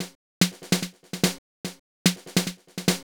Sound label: Techno Snare; Dance DJ; Drums; Pro Tools Essential Loops; Digidesign